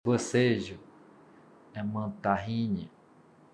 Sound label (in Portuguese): bocejo